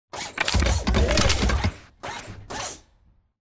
combat run turn; Titanfall 2

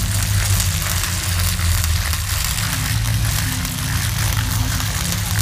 electrical death panel; Titanfall 2

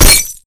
bullet light ballistic solid glass close

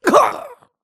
grunt death crush; Titanfall 2

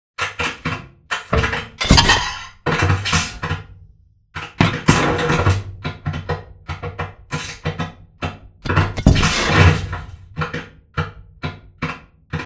Prowler Cage Charge End; Boomtown; Titanfall 2

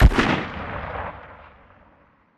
arl dist atk tail; Titanfall 2

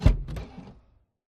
Sentry Turret Body Servo Stop; Boost Card; Titanfall 2

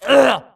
grunt death neck; Titanfall 2

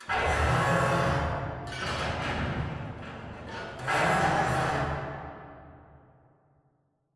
Robot Arm Pass Passer Dist; Boomtown; Titanfall 2

